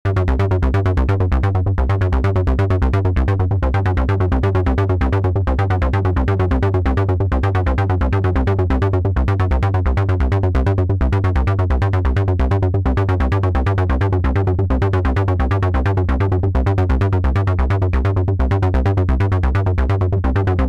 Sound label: Filter Overdrive Full Resonance Boost; ACL System 2; QLIN off on